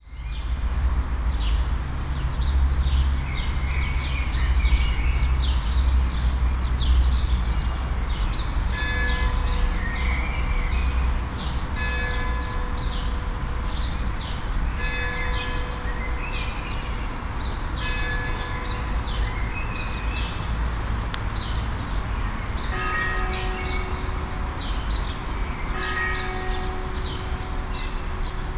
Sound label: maria magdalena kyrka, stockholm